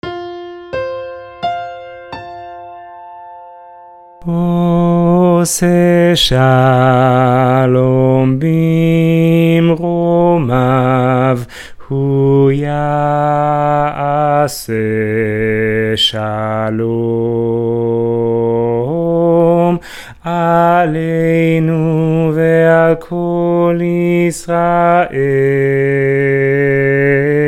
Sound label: spanish bass; BCC choir